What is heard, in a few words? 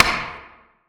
flick; specialround; beatbox